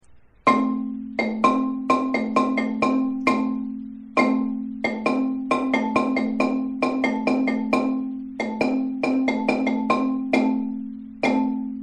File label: layering, marimba